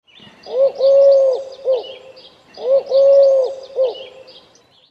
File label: Woodland sounds pigeon